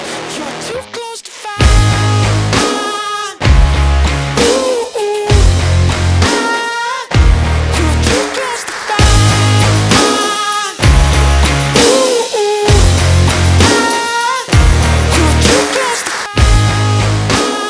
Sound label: allcomp on mixbus